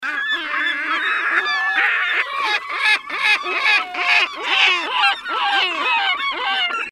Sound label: Long calls, Great Black-backed Gull (Larus marinus); Largest North American gull.; Size: Length 30 in., Wingspan 65in.